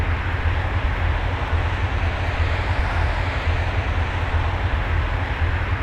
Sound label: road roll V8; F1; FSR 2011